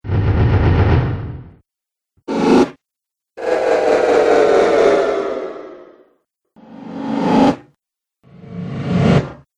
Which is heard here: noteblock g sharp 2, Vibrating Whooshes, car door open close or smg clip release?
Vibrating Whooshes